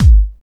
tight bass drum sample g sharp key; kick drum samples

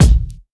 snappy kick one shot a key; kick drum samples

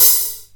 verby open high hat sample e key

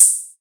studio hi hat sample c sharp key; hits one shots; hat samples

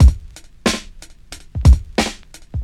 91 bpm drum loop sample C# key

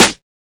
subtle reverb steel snare drum sound e key

